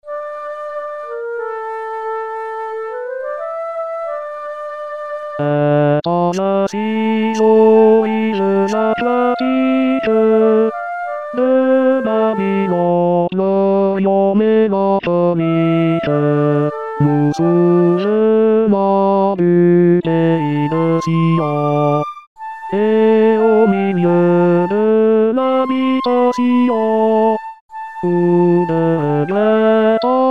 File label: tenor